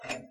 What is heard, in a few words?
Light clunk